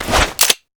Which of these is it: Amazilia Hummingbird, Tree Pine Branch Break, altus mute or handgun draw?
handgun draw